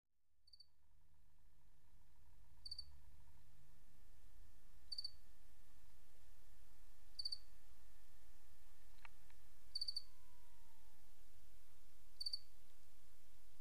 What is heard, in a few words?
Crickets